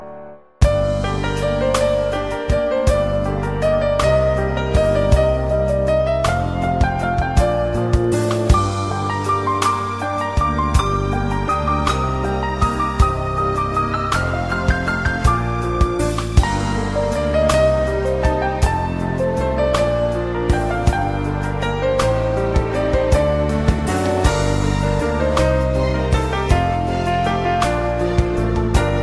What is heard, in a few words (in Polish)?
piekna muzyka fortepianowa